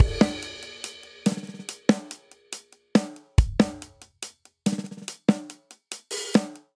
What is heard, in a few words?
Syncopated Folk Breakdown 142 bpm, Drum; MIDI Clips; Ableton; Factory Packs; Drum Booth